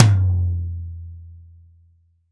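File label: LD Mid Tom, Roland BK-5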